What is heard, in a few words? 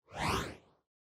Camera Motion Short Effect; カメラモーション06-5(短 エフェクト); シーン・カメラモーション